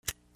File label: FIRE